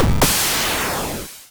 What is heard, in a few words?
double, explosions